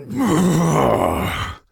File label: UHH; Bascileus